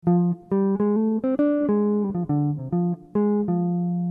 blues

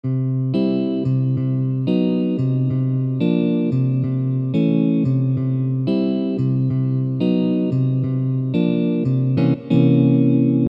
pedal intro

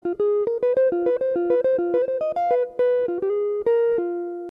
blues

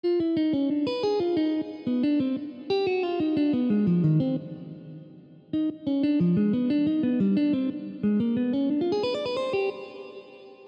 minor 2 5 1

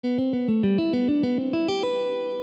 C Major Phrase

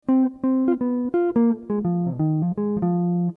blues

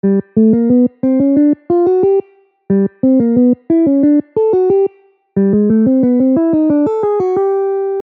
major 251

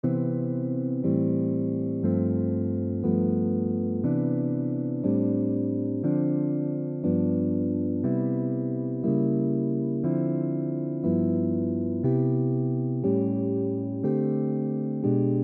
top note cycle

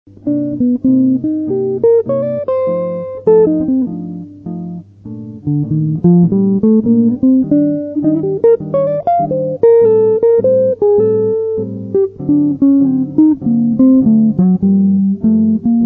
autumn solo

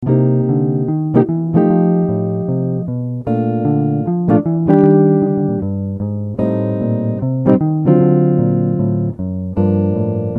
chord

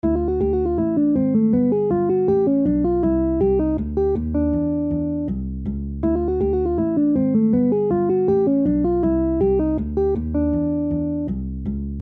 major 2 5 1

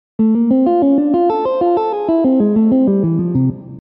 Arpeggio

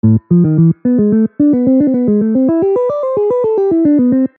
approach

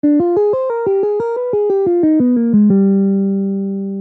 minor 2 5 1

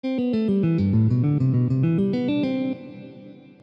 C Major Phrase

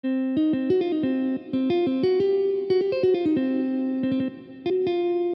minor 2 5 1

